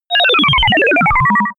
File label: telegraphic synth